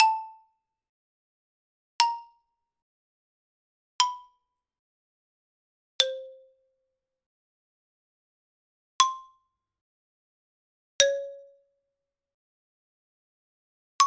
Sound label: xylophone